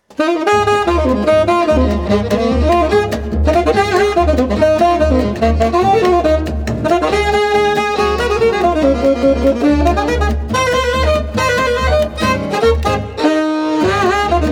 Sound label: jackety sax